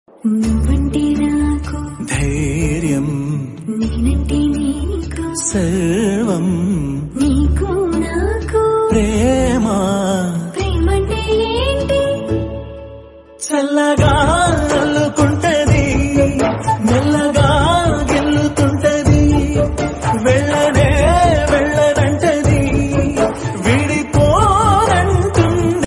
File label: Tamil Song Ringtone